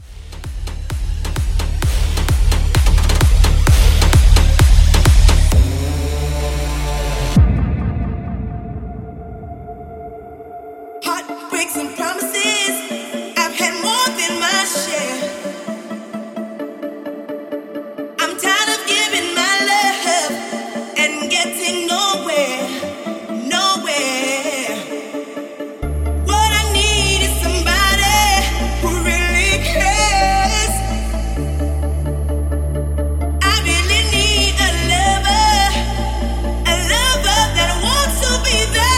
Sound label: Festival Hybrid Bootleg 130